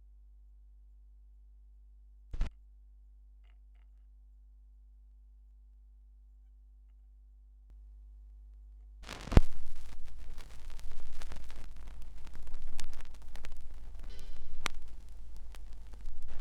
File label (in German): schallplattengeraeusche